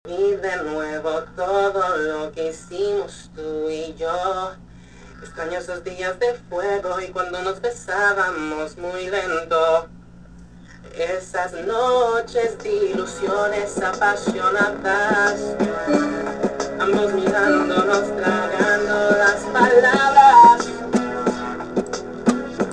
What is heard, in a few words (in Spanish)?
Acapella